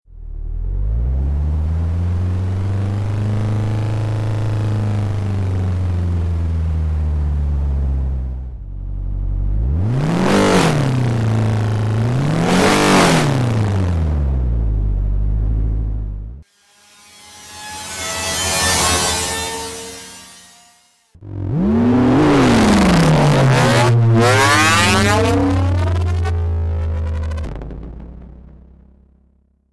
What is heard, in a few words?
race car